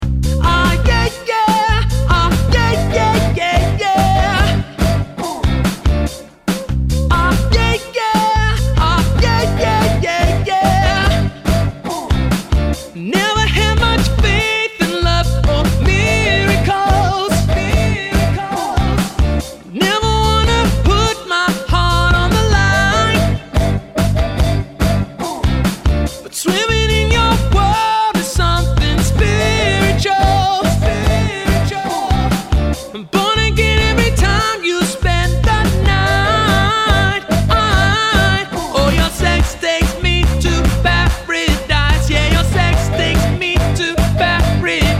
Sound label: Cover Rock Pop Tenor